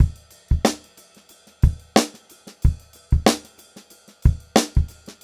Funk-Groove Drumloops

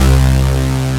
Synths Note C

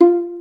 Violin Pizz F3; Instruments & Percussions; InVision Interactive; Lightware VOL-1

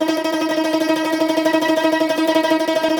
CUMBUS Tremolo

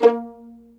A#3 PIZZ, Violin; Q Up Arts; Denny Jaeger Master Violin Library; PERFORMANCE

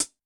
HiHat; Yamaha DTXpress-II

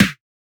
Snare, Dance Drum Kit

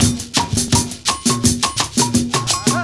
PERCS, 70 s Breakbeats

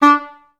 EHS STAC D2, Advanced Orchestra; Best Service; Peter Siedlaczek's Advanced Orchestra

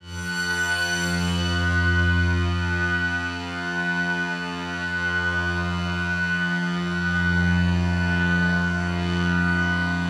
One Shot Drone F, dystopian drone; One-Shot Drones

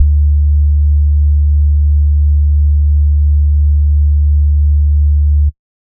SBASS D1, Sub Bass Sine; neuro; Stanza

